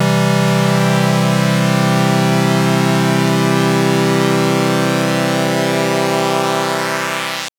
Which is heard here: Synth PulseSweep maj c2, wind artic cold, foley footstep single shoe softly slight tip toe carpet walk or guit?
Synth PulseSweep maj c2